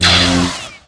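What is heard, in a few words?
Light Saber sabre, Contacts avec d'autres sabres; effets speciaux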